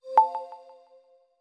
charging; Xiaomi MIUI-9.5

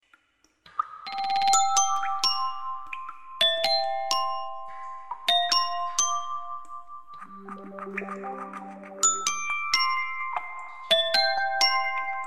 Ethereal, alarms; Tinno ATT Calypso 3

